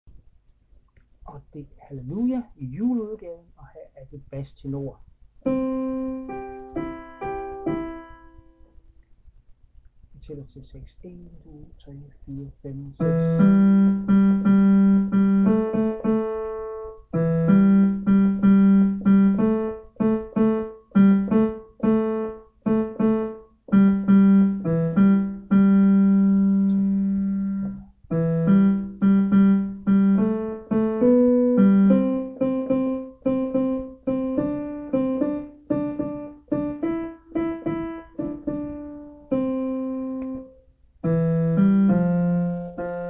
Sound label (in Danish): tenor bas